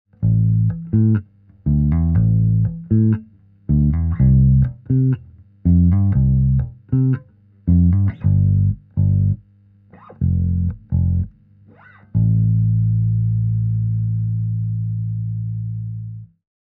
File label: Bass